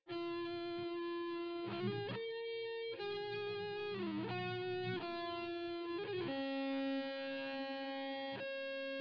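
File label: no drums, instantaneous mixture; SiSEC11; underdetermined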